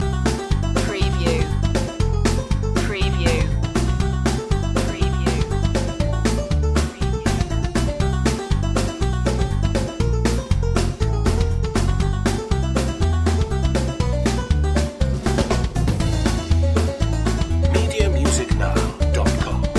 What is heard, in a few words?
banjo run